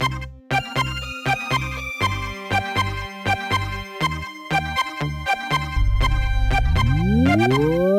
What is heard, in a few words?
Aerobics; Dreamer Series Top Model; 2009 nds gamerip